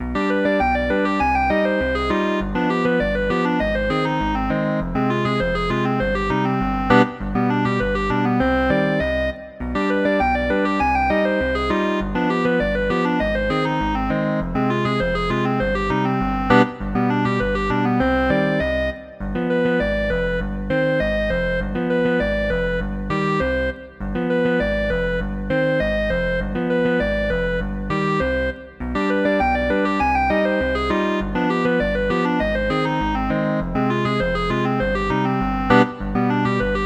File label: Calypso Study Duet no chords